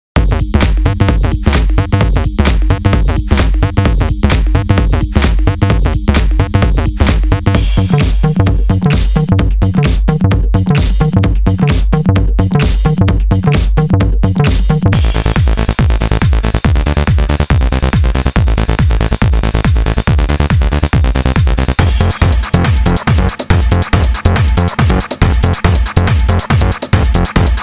Bass Station

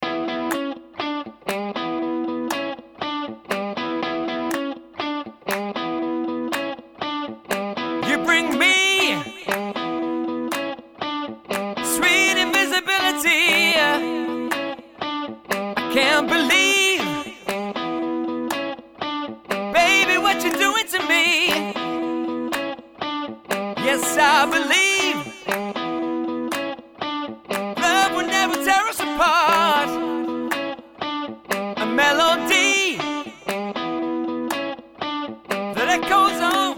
Vocal Attack

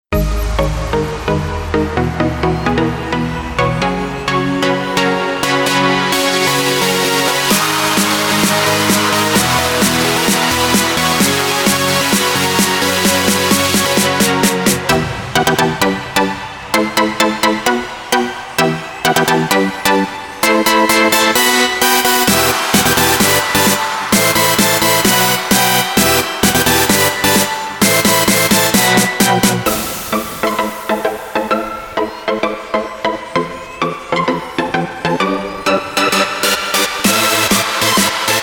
FUTURE ELECTRO FESTIVAL

